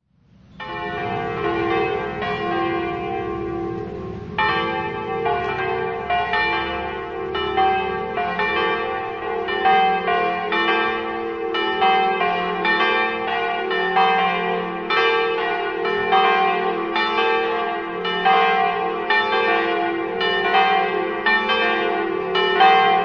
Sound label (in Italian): lignano, campane